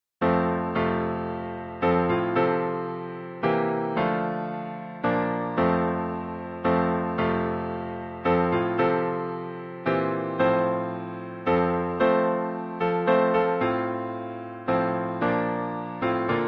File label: Amazing Grace Piano